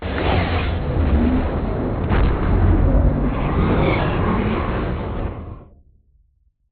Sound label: strafe far; Beacon; Titanfall 2